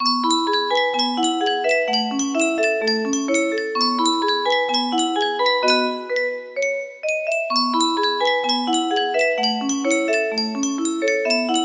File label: music box, music box melody; yunsheng custom tune